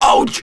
hit; BattleSNDS

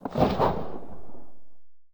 echo building

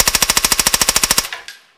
silencer burst

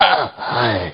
DYING; BattleSNDS

